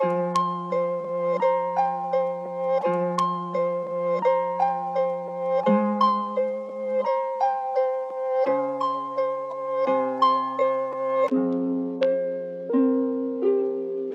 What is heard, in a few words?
F Minor 170 BPM